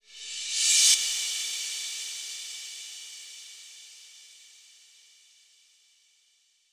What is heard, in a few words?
Cymbals Reverse Crash; Vengeance Samples